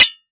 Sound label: Weapon Broad Sword Hit Solid Metal; AdobeCC